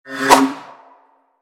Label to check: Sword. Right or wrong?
Right